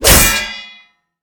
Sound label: 1h Sword Hit Shield Metal; mythic-killstone